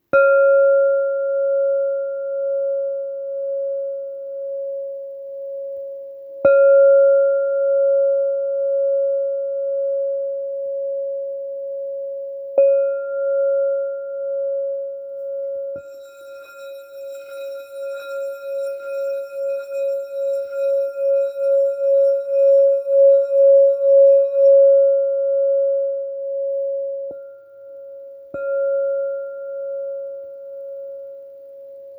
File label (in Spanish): do 552 hz, cuencos tibetanos